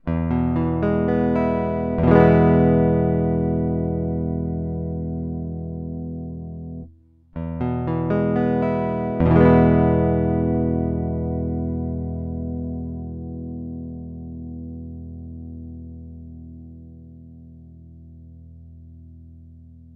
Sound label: Pattern with electronics